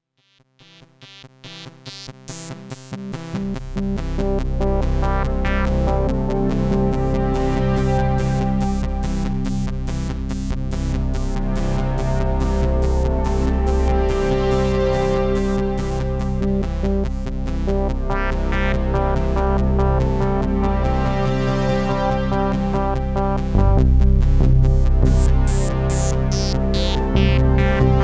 Korg Arp 2600 VST Theme

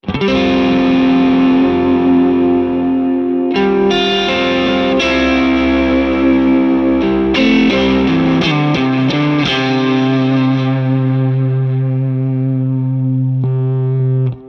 Comp Lonestar Delay Reverb

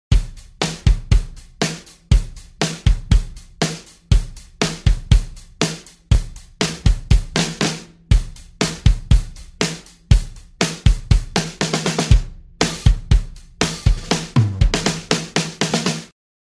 Drum Loop Clean; Bus Exciter 104

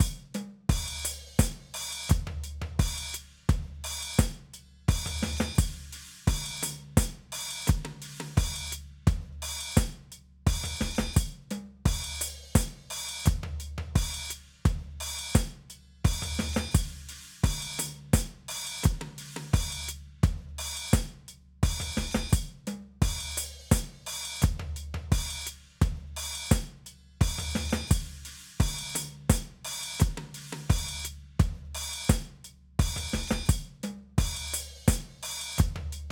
Modern Drummer Precision De Esser; Metric Halo v4

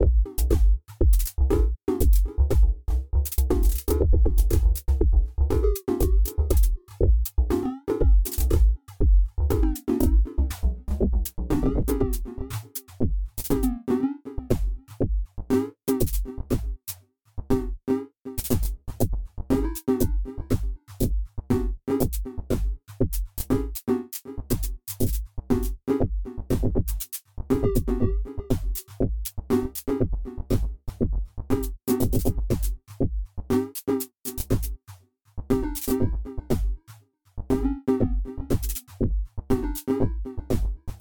sum FX Modulation

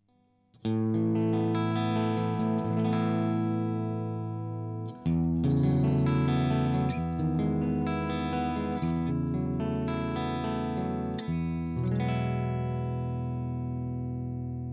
clean Position 3 C414

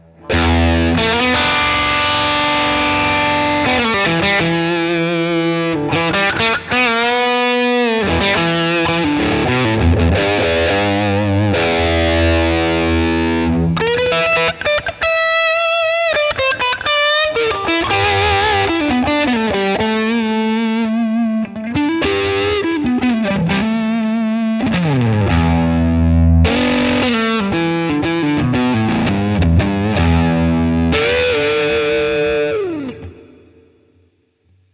Drive Lead Red; Headrush Software Update Glorious

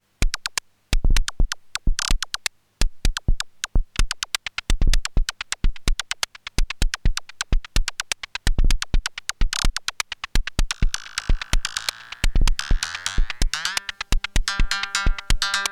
Erica Synths Perkons HD 01 Karplus Strong

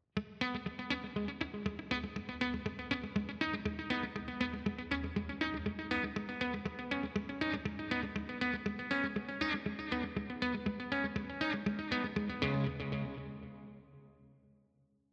Riff RandomHall

